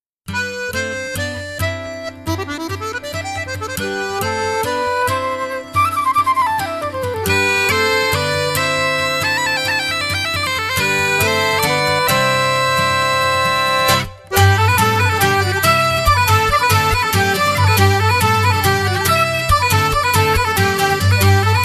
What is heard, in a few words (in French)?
Polka